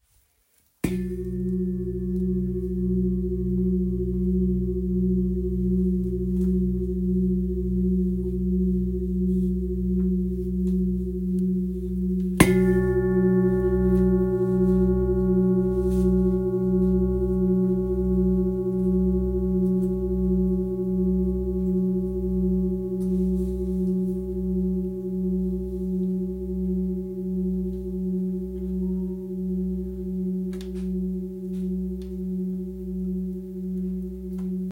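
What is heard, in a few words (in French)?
Gros bol si, bols chantants tibétains; Bol chantant gros volume 4kg et +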